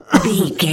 voice male coughing

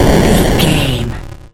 retro explosion deep

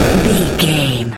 retro explosion general

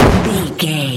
explosion small short

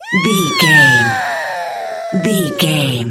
voice female scream

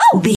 voice female hurt pain high

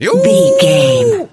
voice male c battle shout

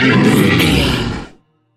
troll monster effort struggle

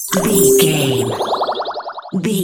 water bubble spell heal

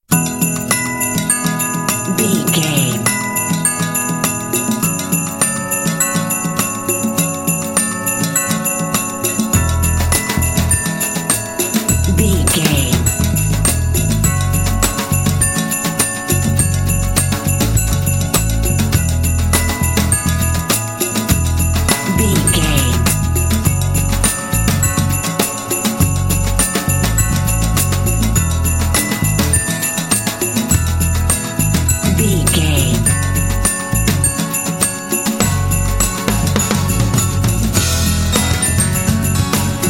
christmas endearing little groove

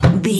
door old wooden close thud

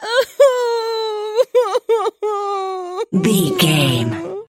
voice female cry

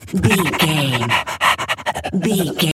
dog sniff breathe

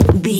footstep wood land

